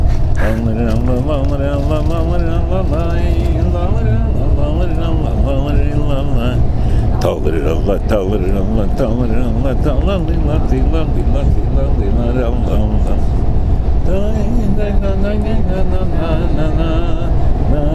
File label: niggun